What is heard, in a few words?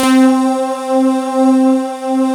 SIGH C4; Big Fish Audio; Synth City; COMPOSITE